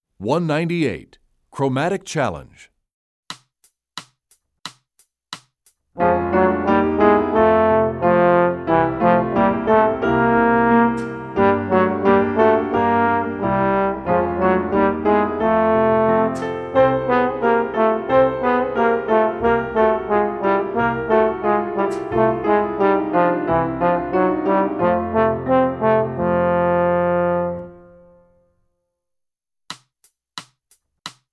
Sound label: Chromatic Challenge, trombone; essential elements